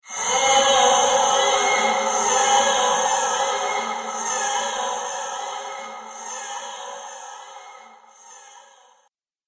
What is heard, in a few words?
Horror Crazy Crying